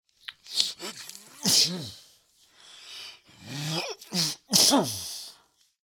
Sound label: fantasy giant male sneezing